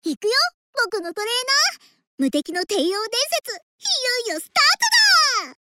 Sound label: applause; osu